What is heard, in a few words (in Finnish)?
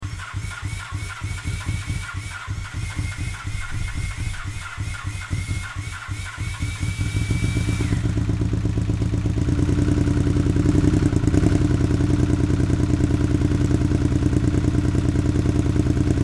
korppusaha Honda VF